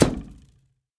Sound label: Arrow Hit; SteamVR; InteractionSystem